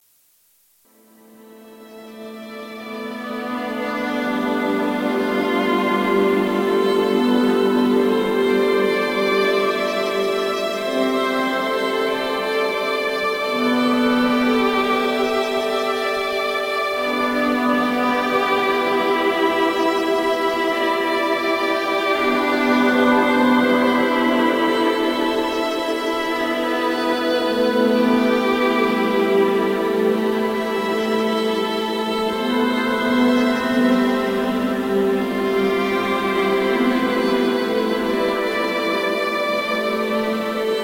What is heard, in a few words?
violine and flute; 40 year old lady